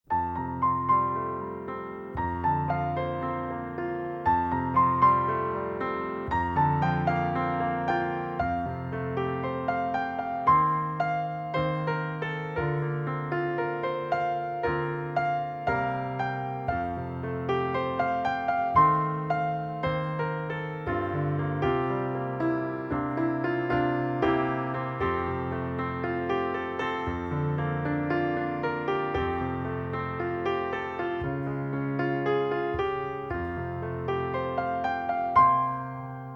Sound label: accomp